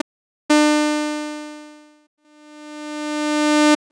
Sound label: saw for back ramp